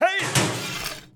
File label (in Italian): guardia della prigione; BLIND QUEST - The Enchanted Castle